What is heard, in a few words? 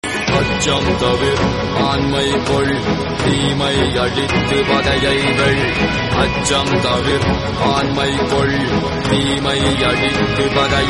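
Songs background music; Bharathiyar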